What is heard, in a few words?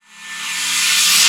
Reverse Cymbal; Vengeance Samples; VEH1 Cymbals